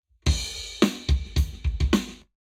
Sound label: Drums, 0deg; Lab Wet